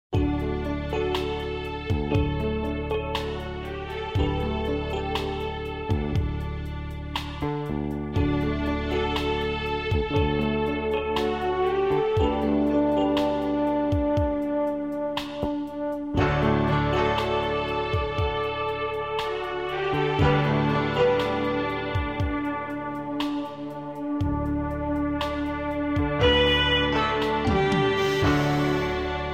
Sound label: Easy Listening & Classical; Sound Ideas